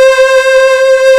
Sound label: SPACE WAVE, PAD; S.PAD